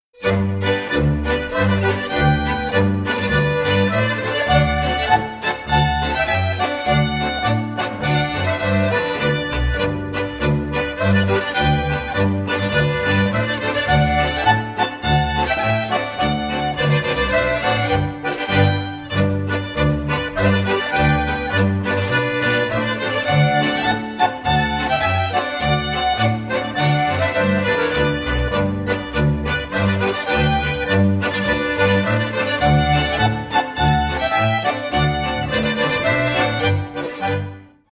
Geigenmusik Schottisch

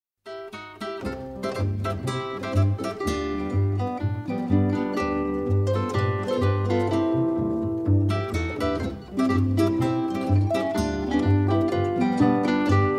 polka

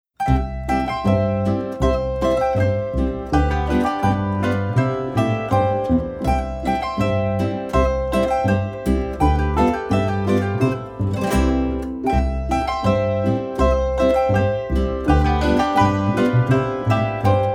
Polka

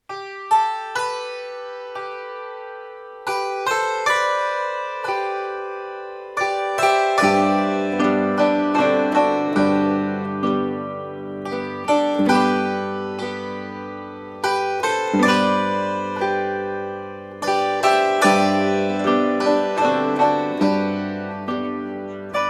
Spielmusik Religioese

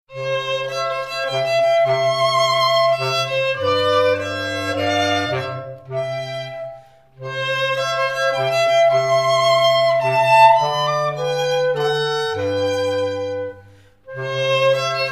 Menuetto; Edler Zweig

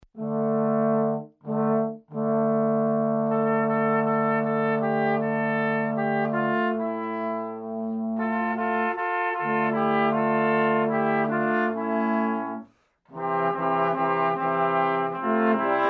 Liedweisen

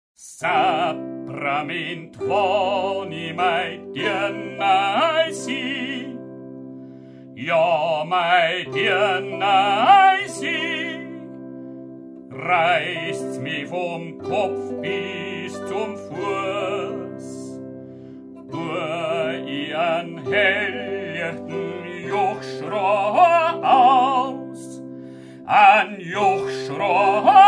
Gebirgslieder; Halbreiter

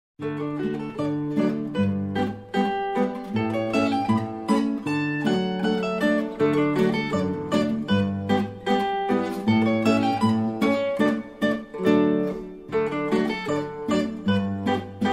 Schottisch; Kreuth

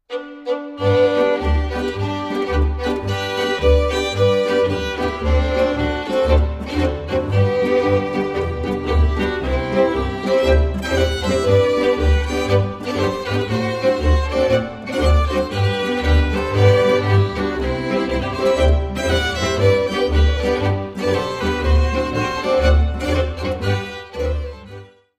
Geigenmusik Polka

